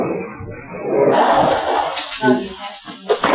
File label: breath